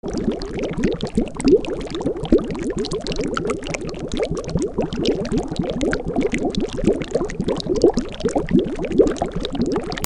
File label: WATER